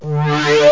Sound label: phaser sweep, synths; Zoom - Release 2; Active Software